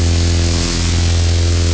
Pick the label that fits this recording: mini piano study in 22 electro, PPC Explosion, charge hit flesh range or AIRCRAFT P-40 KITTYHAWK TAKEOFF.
PPC Explosion